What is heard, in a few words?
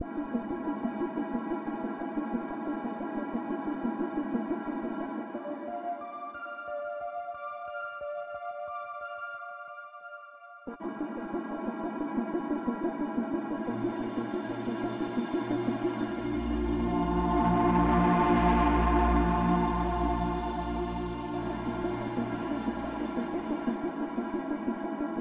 city ambient music; Midnight Legends